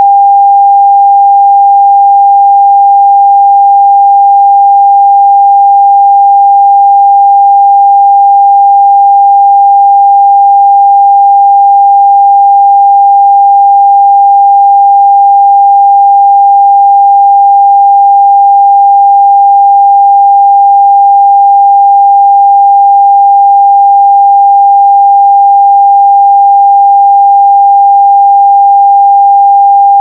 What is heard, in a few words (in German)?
Messton 800hz